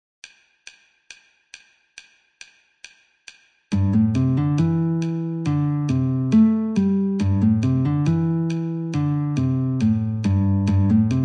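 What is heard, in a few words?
C Guitar metronome 69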